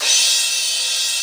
CRASH, DRUMS; Crypton - DrumBase5000; T1 DRUMS

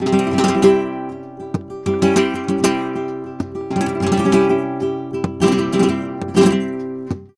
Flamenco, Guitar, Bulerias Y Solea, 197, c

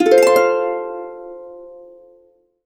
HC F MAJ TRI, Harps; USB Soundscan vol.62; AKAI

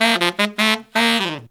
160 BPM, Horns

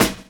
DRUM CHOP; AMG Gota Yashiki Groove Activator

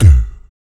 BASS BOMB; ILIO; Vocal Planet; Blues & Soul

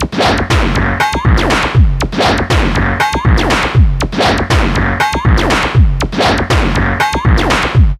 belltear 120bpm

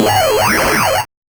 Noise, 8-bit, Bitty Noises

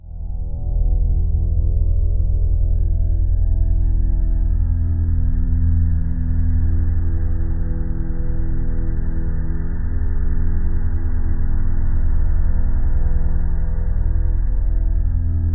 faraway, low tones, ominous drones; USB Soundscan vol.48; AKAI

